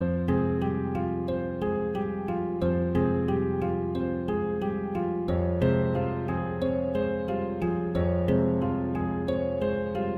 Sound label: first music